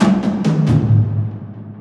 Platforming Transition; song of iron

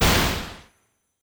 Neutral Large Impact; Idle Wizard

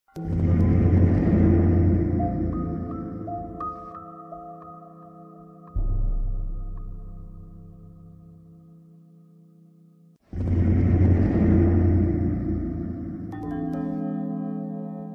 Music Cellar; Slendrina The Cellar; android gamerip 2014

